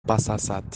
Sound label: rofl english guys